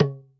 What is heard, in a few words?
Tabla, Percussion, Yamaha DTXpress